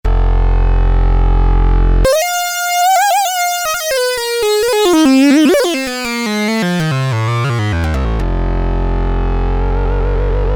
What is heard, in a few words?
Solo Sync, Prophet-5; Sequential Circuits Prophet-5 (Ver.3); Factory Patch; File 2 Programs